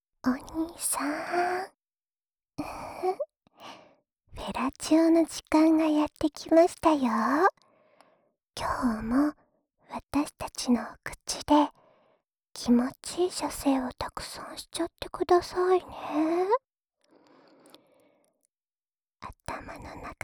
オナサポ用Wフェラループ, ASMR

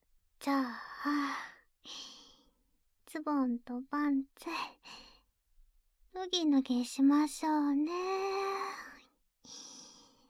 バイノーラル えっち

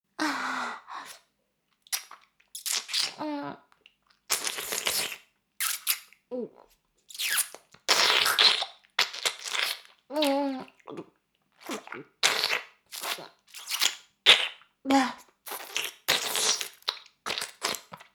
耳舐め音声; 少尉; 牧場戦腺

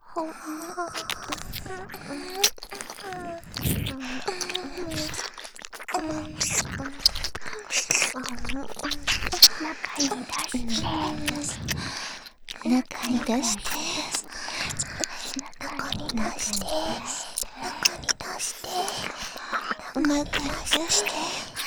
耳舐め; ハーレムオナサポ 4人のメイドがご主人様のオナニーをお手伝いするバイノーラル～文、千曜、名月、莉桜～; 全員

